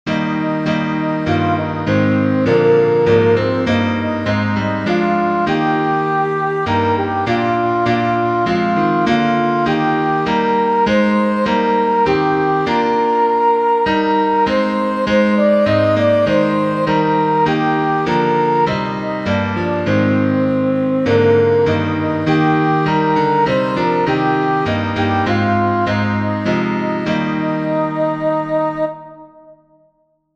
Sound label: slane soprano; “Lord of All Hopefulness”